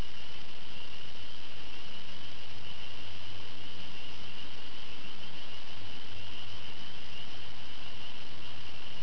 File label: night sounds